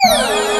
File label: DG WAVE, DIGI SYN